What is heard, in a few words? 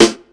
ALA SD; ALAKIT; USB Soundscan vol.31; Miro s Grooves; AKAI